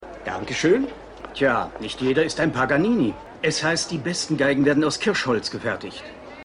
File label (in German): Strassenmusiker; In Geheimer Mission